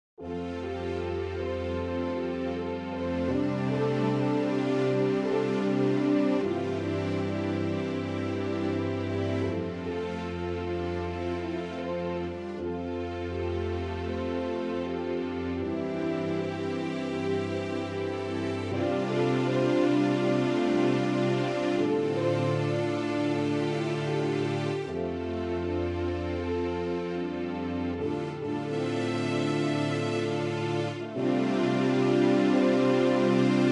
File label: Very Mellow